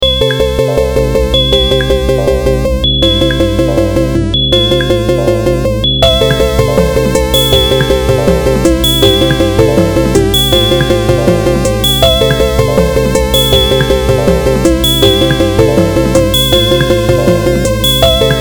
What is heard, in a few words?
beat